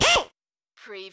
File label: Pneumatic Drill